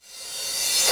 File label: Cymbals Reverse Crash; Vengeance Samples